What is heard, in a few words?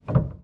piano